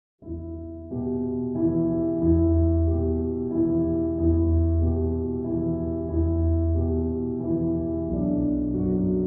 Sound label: soundtrack slowed reverb; medcezir soundtrack; tozludere; yaman